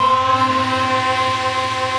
ATMO SYNTH; AKAI S6000 CD-ROM - Volume 1; SAMPLE WAVE MIXING 2; GALAXY

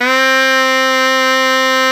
SHENAI C3

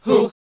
HAL GOSP. MIXED

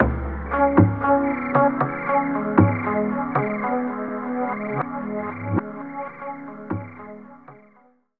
DR. LOOP, TRANCE; X-Static Goldmine 3

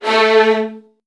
VN 16TH, 3A1; ILIO; Kirk Hunter

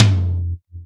Yamaha CS6

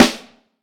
Vintage Snare Drum

